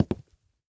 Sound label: FOOTSTEP Leather Wood Hollow Walk; Don't Be Afraid The First Toy